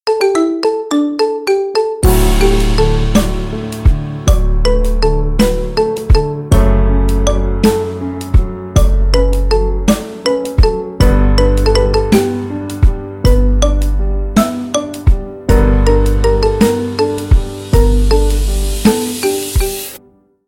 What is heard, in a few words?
marimba remix